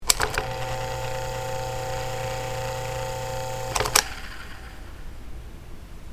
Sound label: electric fan motor blades removed